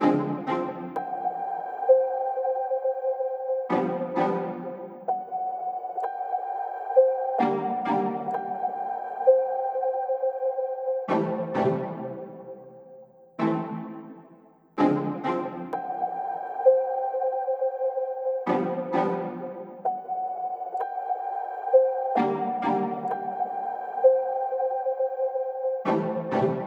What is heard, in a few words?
130 BPM Cm, sad love